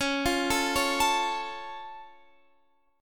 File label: C sharp Augmented C sharp; arpeggiated